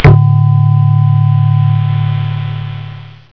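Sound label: electric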